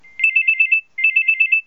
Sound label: nokia 3510i ring ring ringtone